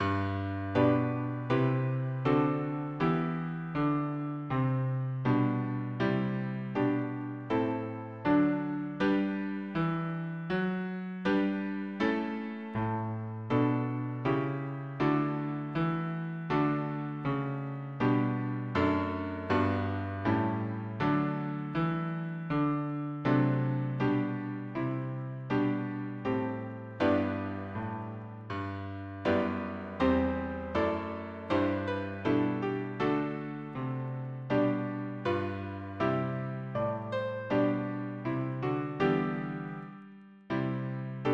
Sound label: harmony piano